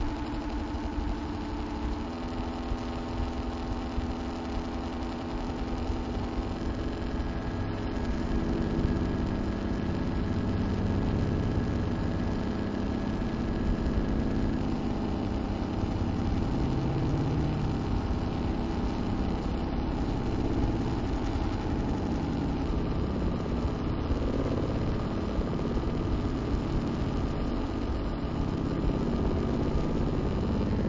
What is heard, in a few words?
ambience; fear